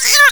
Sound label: pain; faerie